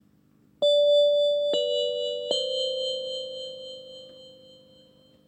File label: Bell notification